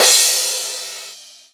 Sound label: Crash, Cymbals; Vengeance; Essential Clubsounds Vol.4